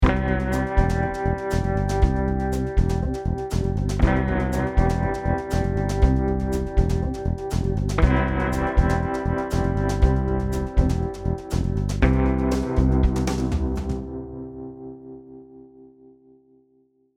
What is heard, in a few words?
Surfer Stinger